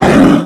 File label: death thunderlizard small, creep deaths; dota